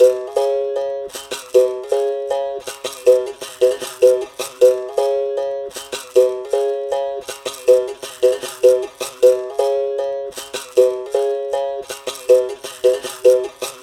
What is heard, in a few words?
berimbau; Calango